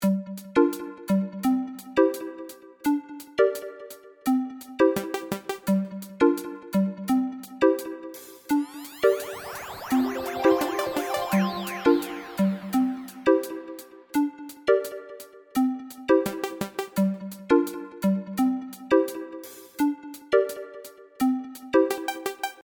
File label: triangles loop; RGB Matrix Slot Machine; Adafruit